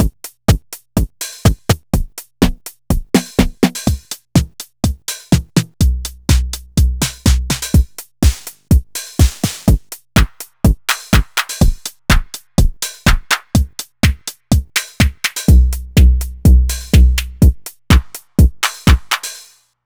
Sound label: analog drum kit